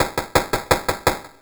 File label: Electronica Drumloop